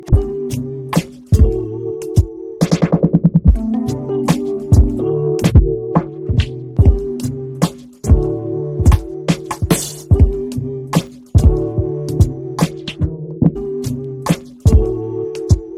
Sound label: 70s Drums; Audiowanderer; AW Legacy Bundle